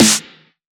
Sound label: present snare single hit A key, snare tuned to the A note